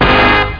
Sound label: Horn hit; Instrmnt; Sound Library 2000; Wayzata Technology